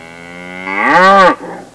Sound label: cow mooing; Sound Effects for Multimedia - Volume 1